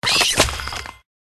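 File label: Scolopendra attack UP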